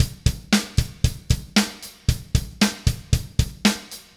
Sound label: Haze Beat, Indie Rock; Sonic Foundry (Sony Creative Software); Indie Rock Anthems; Session 06 - Haze